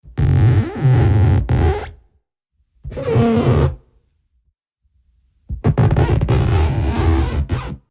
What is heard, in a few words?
rubber squeaking